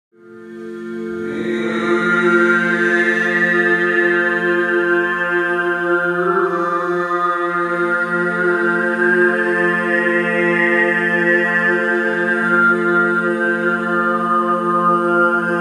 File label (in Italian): CANTO ARMONICO; Canto Armonico 5 parti